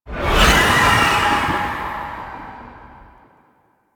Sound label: Apparition Release; Warframe; Dagath